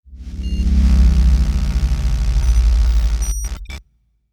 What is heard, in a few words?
Sci Fi Power Down; SoundsCrate